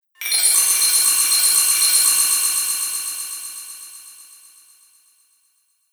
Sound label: Spell Repeating Magic, magic sound effect; Fantasy Sound Effect